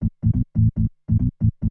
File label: TECHNO BASS 140 A